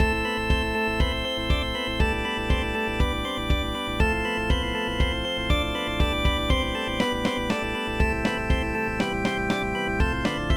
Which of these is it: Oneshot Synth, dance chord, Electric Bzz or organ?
organ